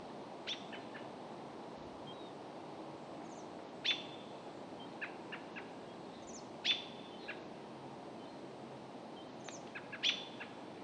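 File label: American Robin call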